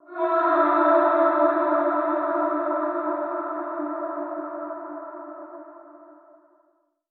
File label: FX Cry D Min; FX Collection; Hip Hop